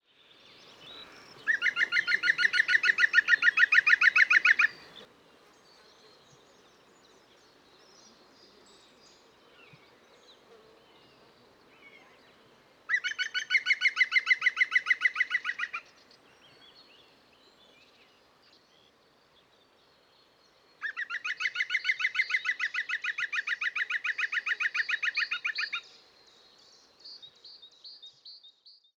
zöld küllő Picus viridis

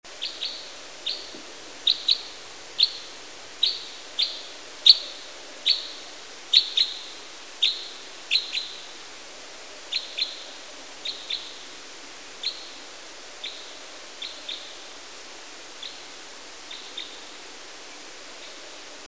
keresztcsoru Loxia curvirostra

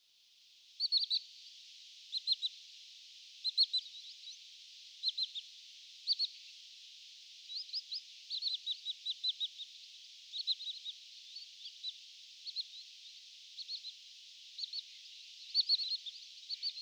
reti canko Tringa glareola

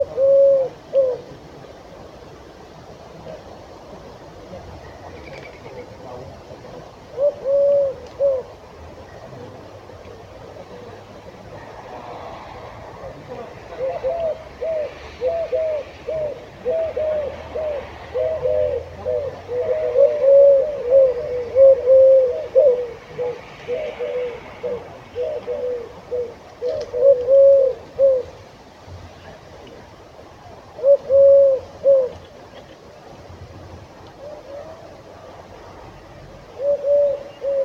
balkani gerle Streptopelia decaocto

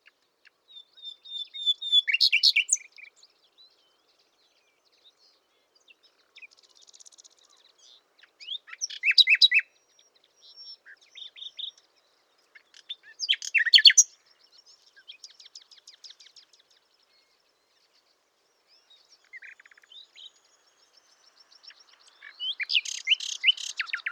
fulemule Luscinia megarhynchos

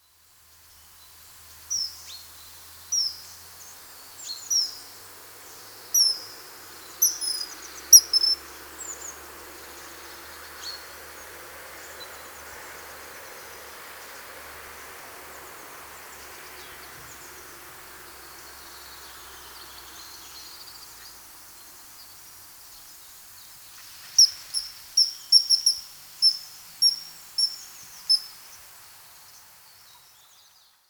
jegmadar Alcedo atthis

